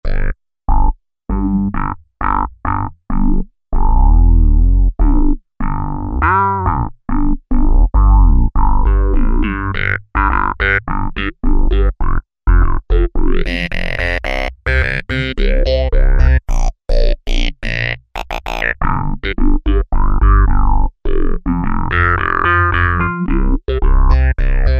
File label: Voco Bass, Roland V-Synth; Factory Patches